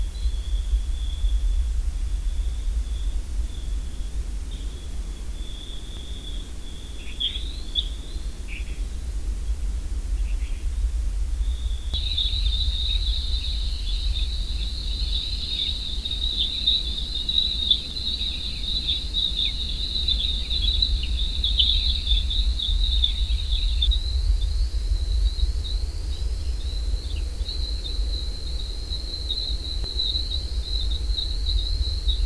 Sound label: WFR audio emfisis